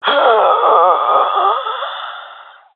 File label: death, jungle fem; vo; agents; Source Model Packs; Counter Strike Model Pack; CS2; jungle fem epic